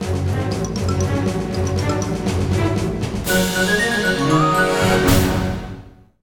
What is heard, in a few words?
Bosscombat Win; Bosscombat Win Ch02a 02